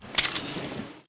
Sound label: zipline detach; Titanfall 2